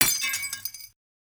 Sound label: glass break; teardown; ps5 windows xbox series xs